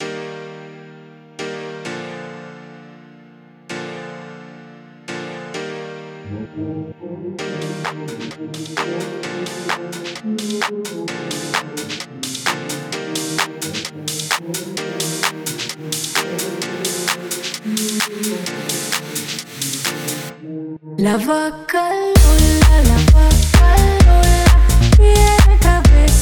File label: EDM moo